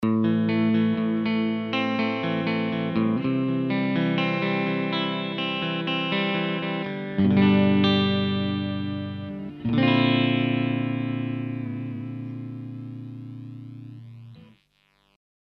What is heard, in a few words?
Tap tremolo pan strat; line6; tonecore; pod